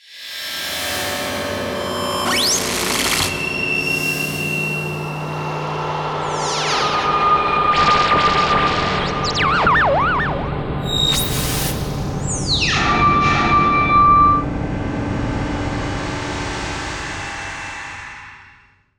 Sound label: Imaging Electronic Whoosh Digital Beeps Radio Tuner; Production Element Title Imaging